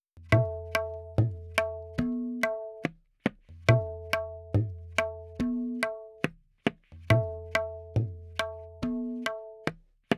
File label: Tabla, 4-4, 70; Sample Banks; Percussion